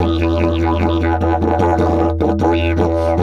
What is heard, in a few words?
E 150, DIDGE RIFFS; Big Fish Audio - Didgeridoo and Other Primitive Instruments